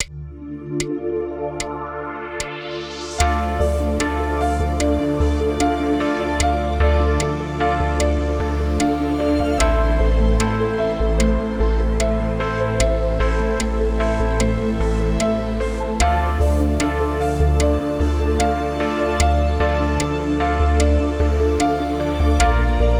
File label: Dance